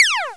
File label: hit